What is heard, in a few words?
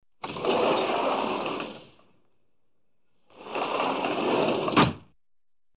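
sliding doors; открывания и закрывания деревянных и металлических дверей; Сборник звуков открывания и закрывания деревянных и металлических дверей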